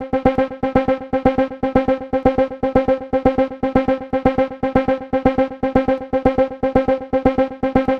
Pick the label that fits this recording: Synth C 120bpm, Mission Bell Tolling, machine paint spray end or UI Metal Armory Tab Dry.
Synth C 120bpm